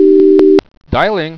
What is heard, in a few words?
DIAL 2 TLK; Windows